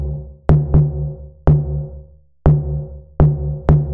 Drum Big Drums, 122 BPM; mmb drum arsenal vol 1

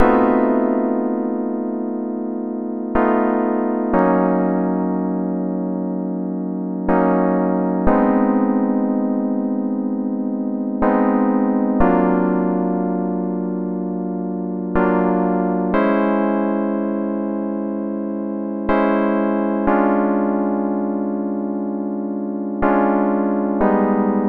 122 BPM Tonic D Cmaj Amin Chord Progress; mmb chord progressions essentials

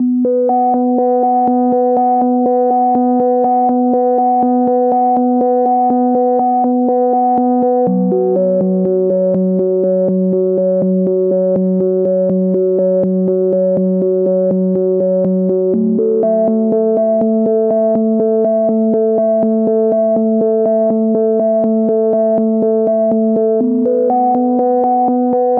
122 BPM Melodic Arps Cmaj Amin Tonic A; mmb studio melodic

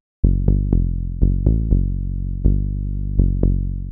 122 BPM Bass Synth D maj B min Tonic F; Pro Bass Synth Studio Kit; MMB

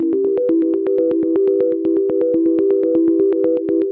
122 BPM Arps C maj A min Tonic E; arp essentials

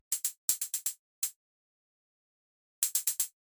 Hats Hi Hat, 122 BPM; Spectrum; mmb studio melodic

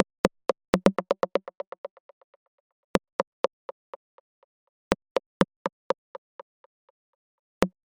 122 BPM Rims; MMB; Percussion Essentials

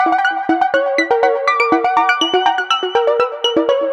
122 BPM Sequence Amaj Fmin Tonic B; innovative sequences

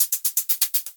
Hat, 122 BPM; Premium Hat Collection; mmb studio melodic

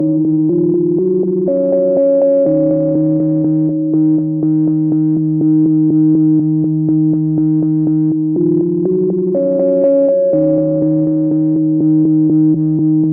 122 BPM Melodies Cmaj Amin; Tonic D; mmb studio soundtrack essentials

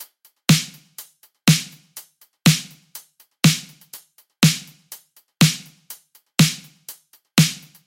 122 BPM Battery Full Drum Essentials Pro; full drum kit

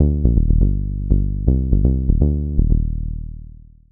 122 BPM Bass Synth A maj G min Tonic D; mmb studio melodic

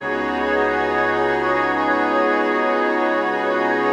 122 BPM Brass B maj G min Tonic C; mmb studio soundtrack essentials

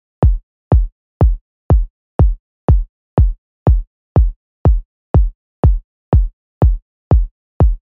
122 BPM Kicks Step 3; mmb drum arsenal vol 1

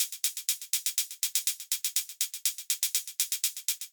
Hats Hat Pulse, 122 BPM; mmb studio melodic

